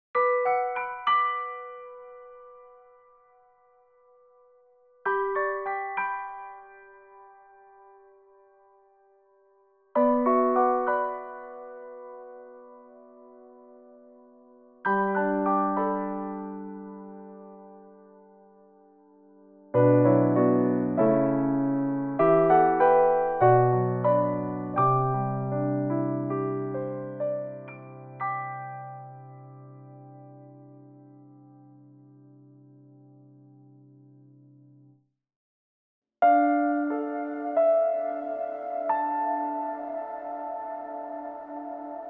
Soft Large

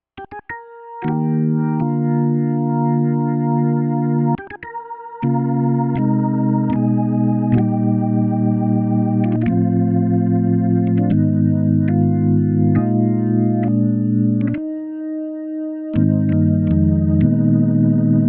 Mellow

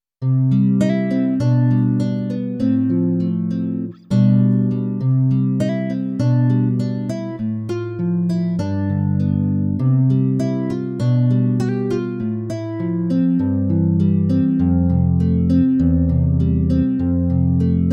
Kronos Super Stereo

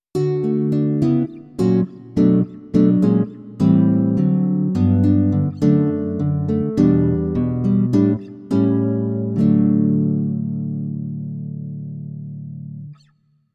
M3 Super Stereo

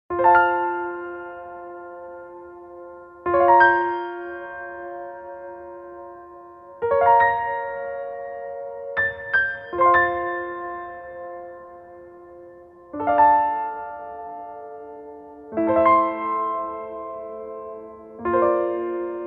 Tri Full Dampers

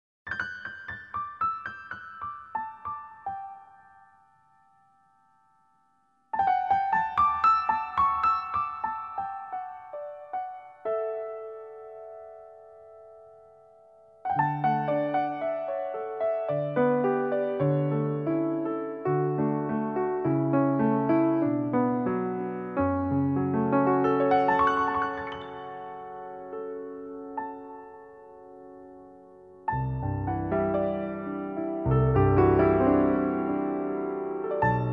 Steinway

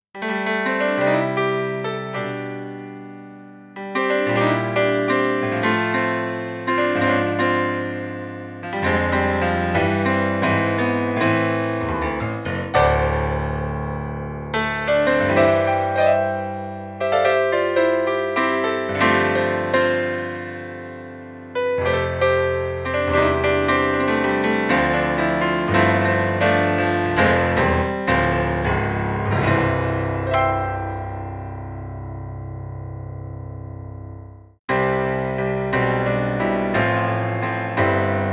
PC3K Bright

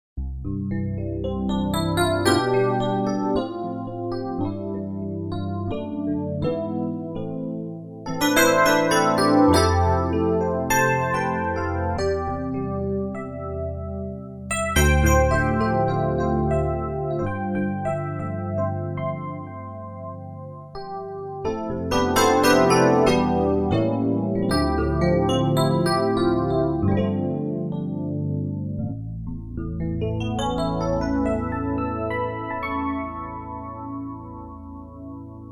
FM EP 8 Vel

